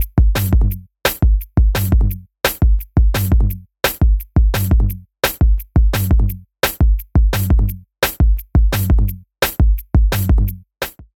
HOFA IQ Series Transient Drum N Bass Loop Bearbeitet